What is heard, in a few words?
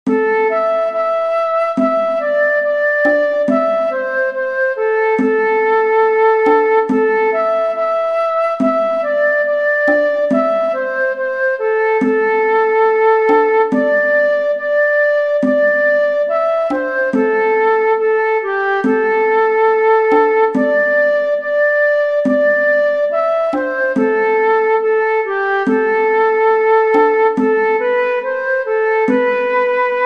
Cajon; Ani couni chaouani